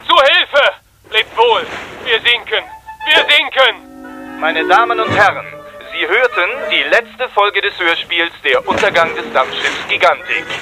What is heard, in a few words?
tugboat radio